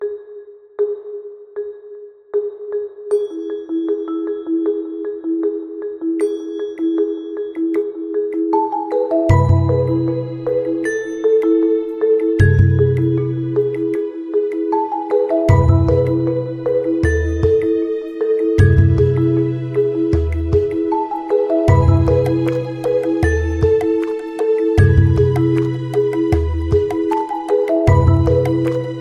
huawei nova alarm ringtone

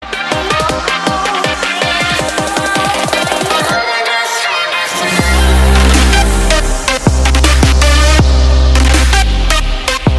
vodafone remix ringtone

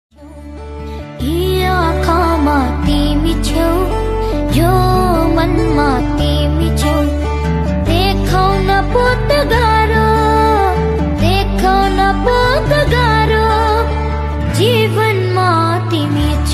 nepali ringtone

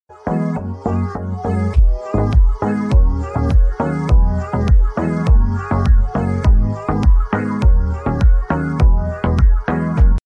love controller rythm ringtone

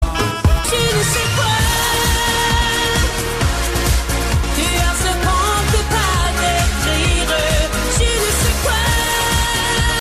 new french ringtone

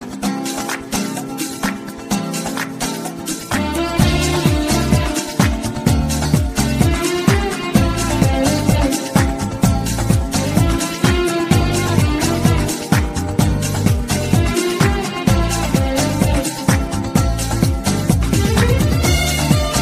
arabic ringtone

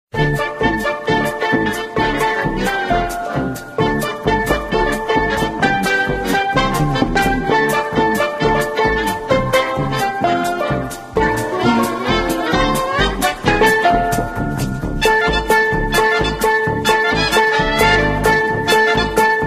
oppo f5 ringtone

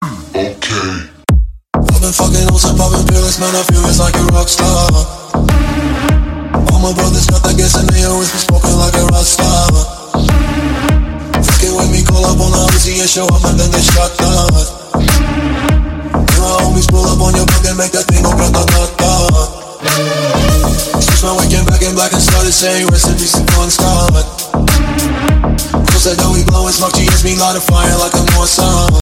arabesque tone; best english

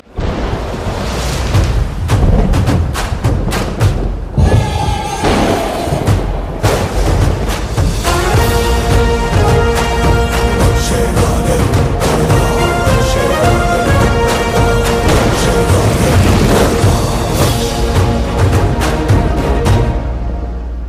telugu tune ringtone; amitabh bachchan; khudabaksh

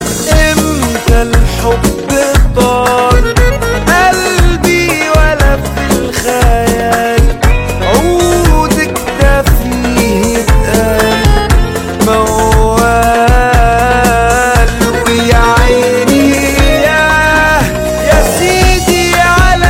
best turkish ringtone

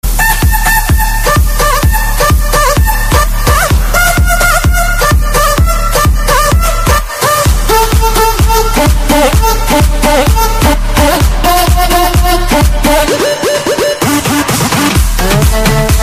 trance love ringtone

